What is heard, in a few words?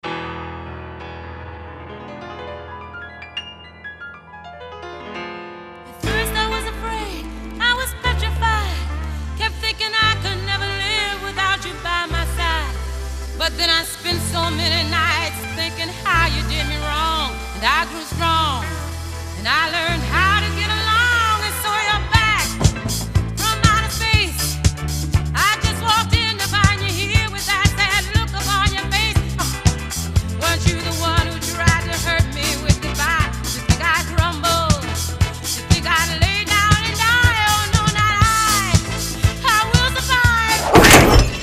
Alien Song